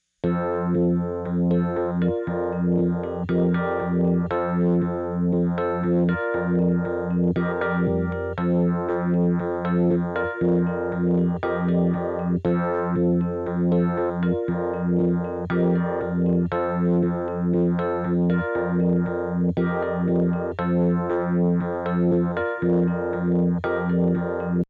ripple phaser

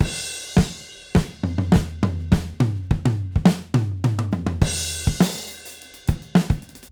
Toms AFTER

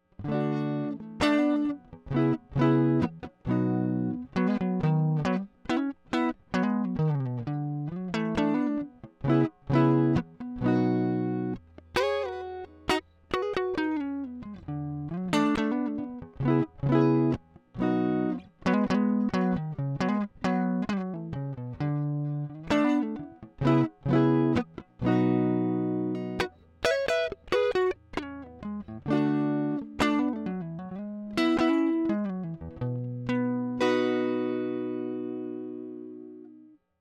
strat stock

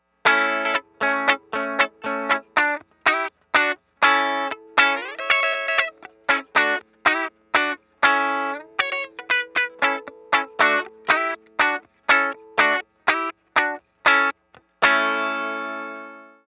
Tele top inst channel

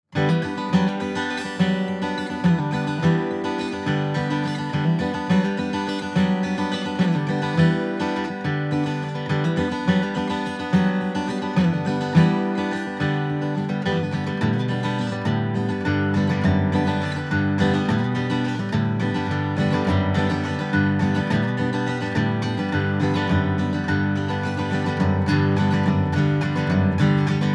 brass

